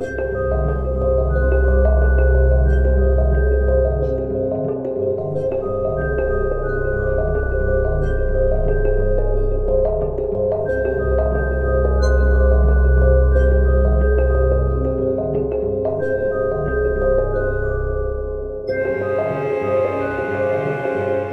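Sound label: music ocean abyss suspense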